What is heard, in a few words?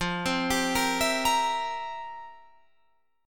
F Major 7th Suspended 2nd Suspended 4th, F, down, Guitar, Open F; arpeggiated